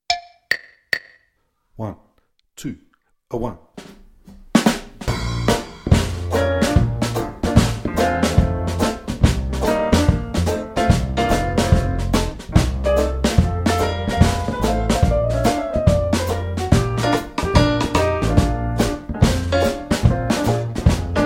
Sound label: backing